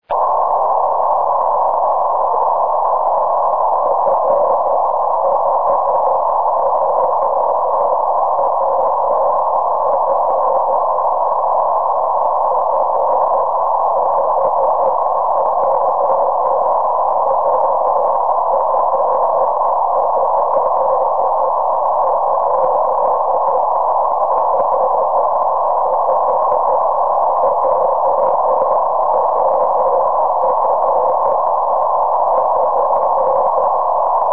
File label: EME 10GHz, CW; Italian EME 2004